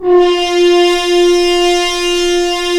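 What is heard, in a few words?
french horns, swells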